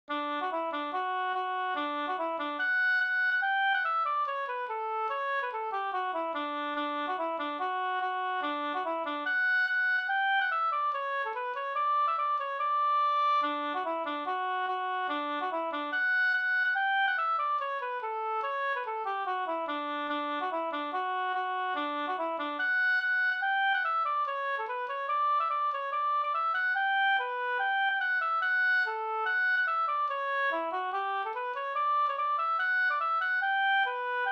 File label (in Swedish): reinlender